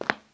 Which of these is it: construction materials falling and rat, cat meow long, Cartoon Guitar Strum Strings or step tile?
step tile